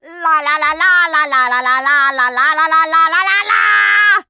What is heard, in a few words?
Music